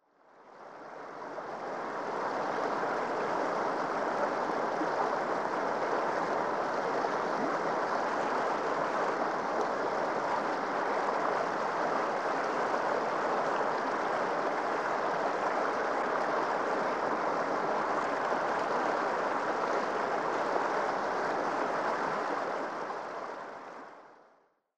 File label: river wide flow atmo